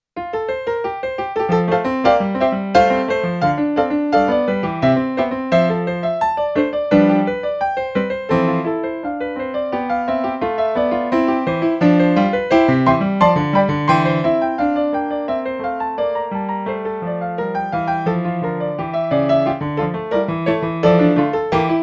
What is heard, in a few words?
bach prelude in f major; bwv 928